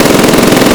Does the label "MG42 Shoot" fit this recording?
Yes